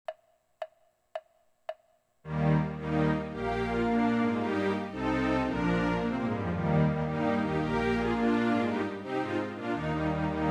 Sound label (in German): hornkonzert orchester; mozart